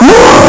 pain; eva-01

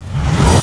power

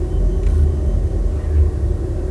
dark; baseq2

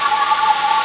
las burn; rogue

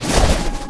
hammer launch

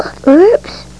fall

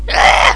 pain; potator

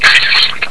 cluck; lmctf

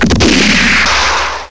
winchester; gunslinger

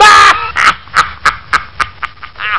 male laugh; lmctf

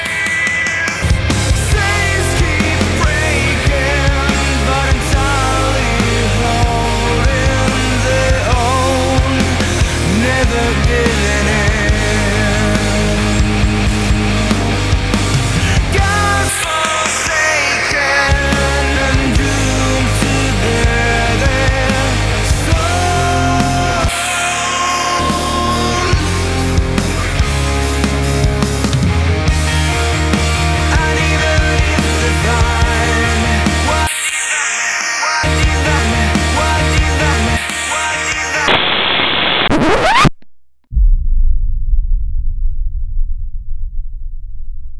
radio boom; spawngirlsounds; marina

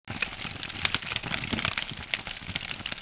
fire

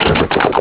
pain; opentdm; citrusfrog

